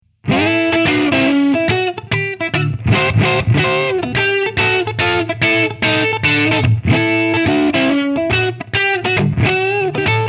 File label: Plexitone Humbucker Neck; Carl Martin